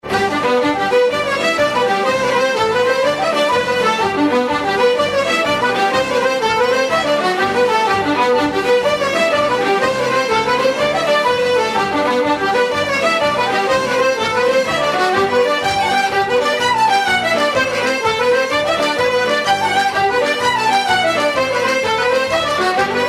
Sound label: Sean Ryans Jig